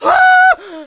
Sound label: death